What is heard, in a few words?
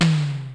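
Electronic Tom Low, Yamaha DD-7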